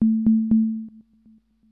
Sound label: glass imminent; sourceforts1941; xenon